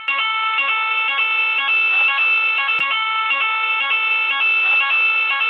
Alarme Incendie

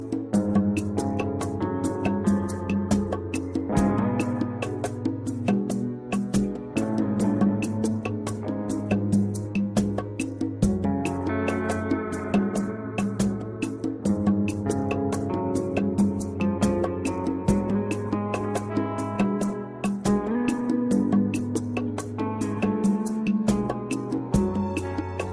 Guitare

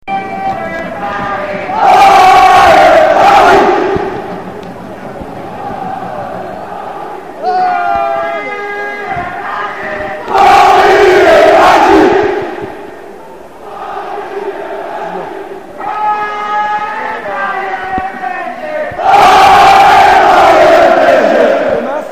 Allez Paris; Psg

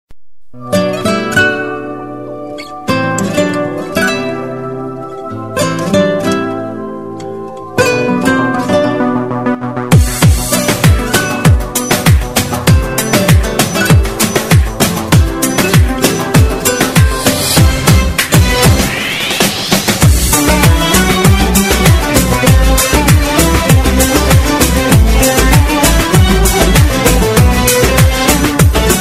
Guitare

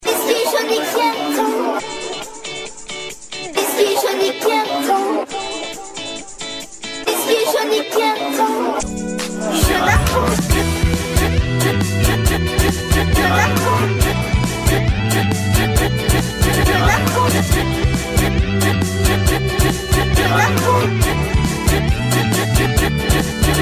sonnerie

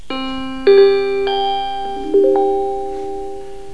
Bruitage - Sonnerie

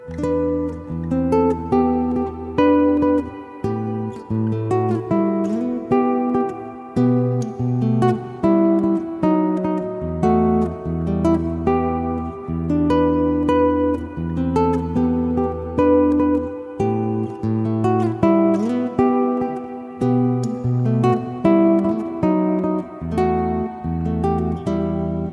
Fragile Guitare

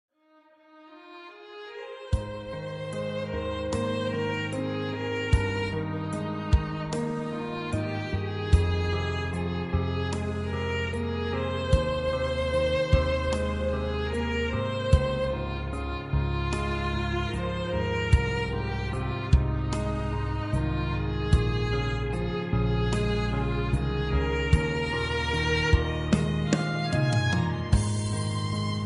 Violon

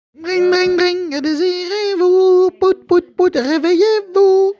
Reveille matin

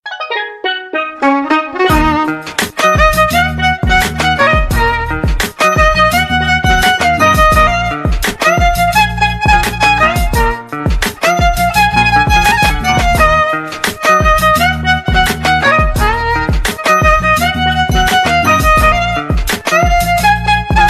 violon

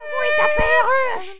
Perruche

